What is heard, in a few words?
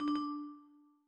text Error; NVDA; Cubic